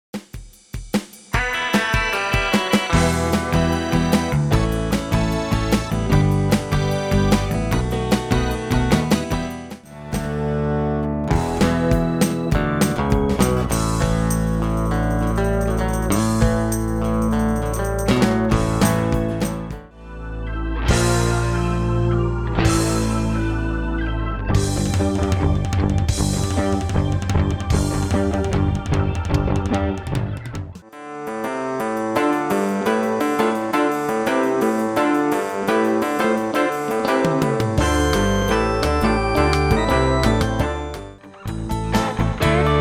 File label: Totaal Classic Rock, music on hold; music-libraries-music-on-hold